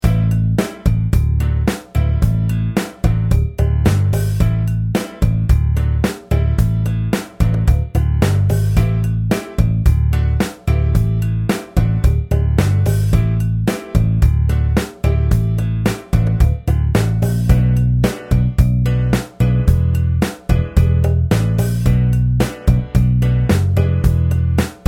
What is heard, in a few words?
Latin Rock Rhythm